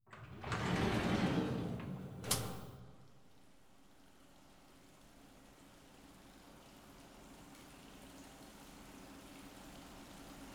洗髪、背中流し ASMR; お背中流し; 罰ゲームはお背中流し。

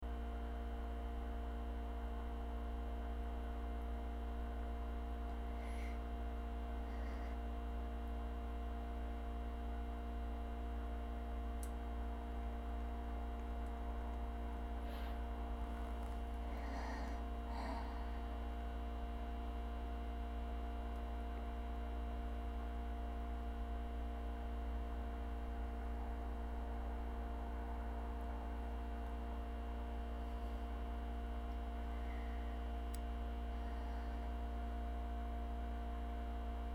かすかな吐息

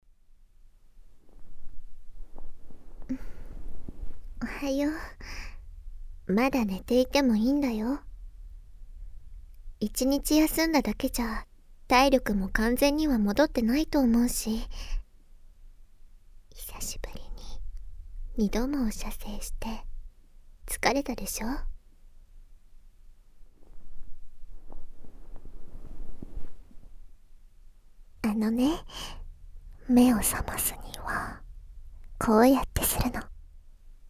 耳舐め; おっぱいと舌で濃密両耳サンドイッチ責め(耳舐め・乳圧耳責め・お耳サンドイッチ)乳圧音なし; 効果音なし

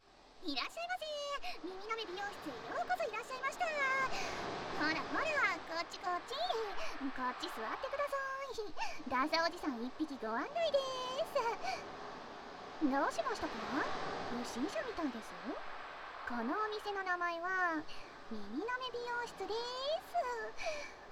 右耳奥舐めマッサージ, ASMR

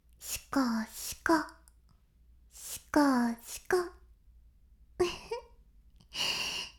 しこしこ音声, ASMR; 白くて黒い魔性円環

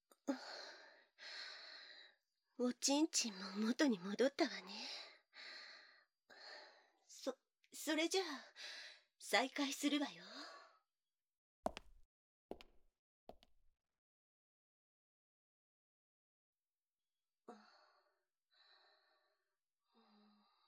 興奮の

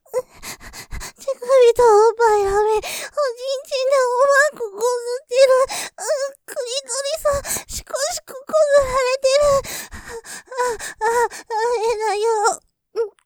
喘ぎ 喘ぎマシ, ASMR; 乳首とおまんこ・しこしこヤバイ; モノラル; ループで楽しむ！シェフの気まぐれ抜き抜きコース

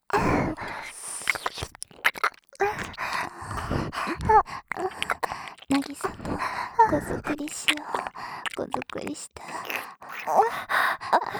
耳舐め 喘ぎ

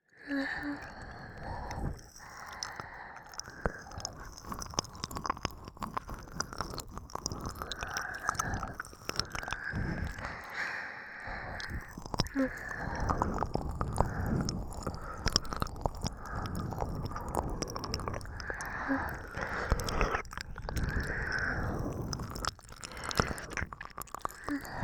両側耳舐めのみ

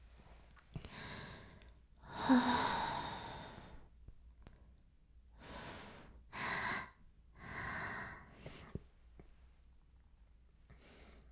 耳舐め音声(LR逆); 白くて黒い魔性円環

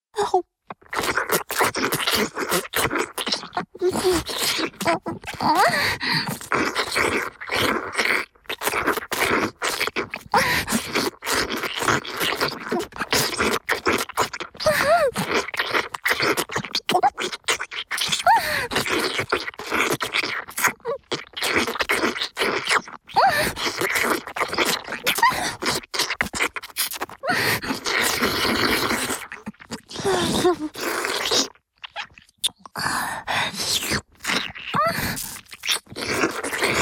フェラチオ(強):自分の性器をいじりながら陰茎を激しくしゃぶる, チュパ音; チュパ音抜粋集

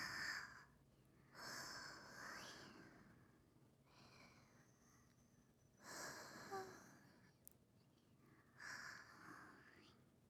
すやすやループバージョン

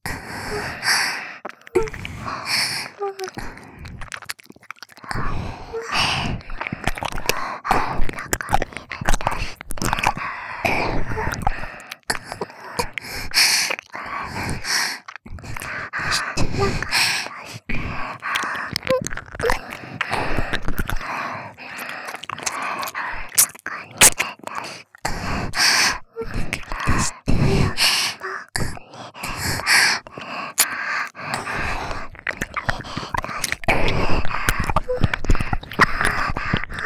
中に出して 耳舐め喘ぎ

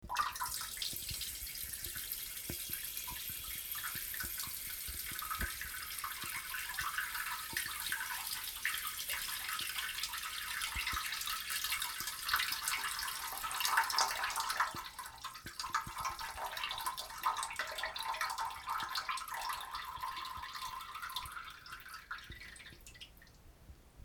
ぱちゃしょーぱちゃちゃおしっこ

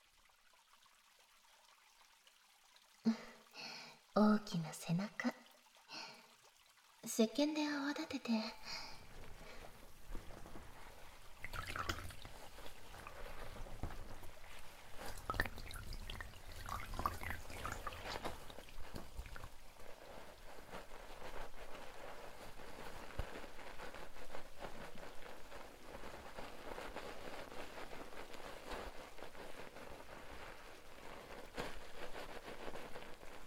ボディ&シャンプー

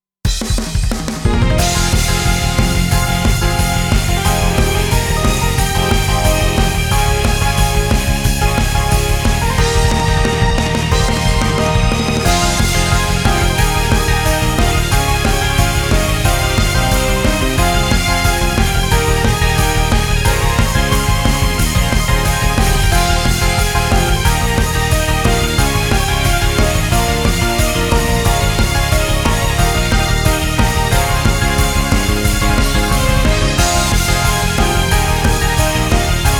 シーンミュージック

